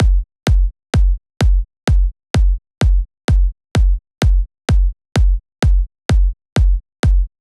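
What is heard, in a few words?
Drum Loop 128 BPM Solo bass drum; VEC5 Drumloops; VEC5 Multi Loops 128BPM; VEC5 Multi Drum Loop 38 128BPM; Loop Elements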